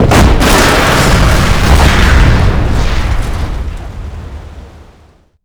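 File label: tank explosion large; WAR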